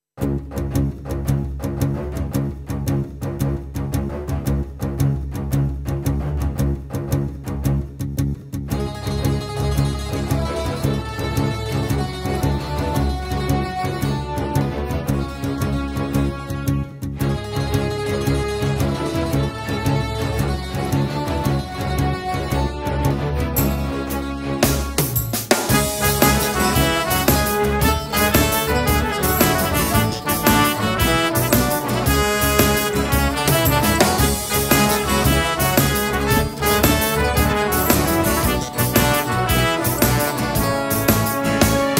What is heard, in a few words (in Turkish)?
coskulu fon muzigi enstrumantal; graf dijital muzik